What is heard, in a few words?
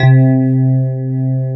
FM ELP C2, Keyboards Old School; USB Soundscan vol.09; AKAI; FM ELP 3